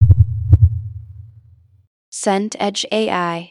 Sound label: explosion muffled